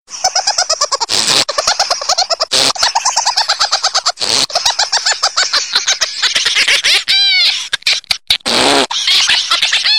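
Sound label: smeh, laughter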